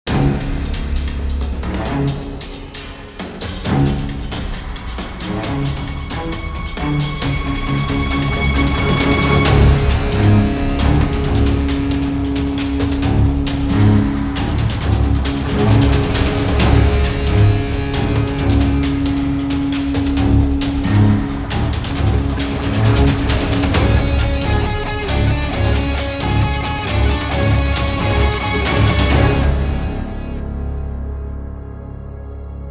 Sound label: Fast, Guitar and Perc, Action